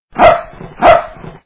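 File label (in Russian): лай собаки на проходящих мимо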